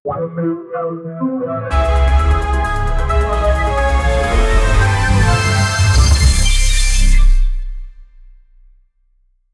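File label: funny cinematic intro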